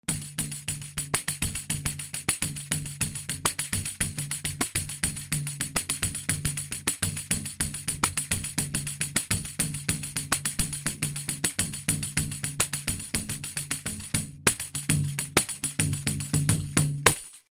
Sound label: pandeiro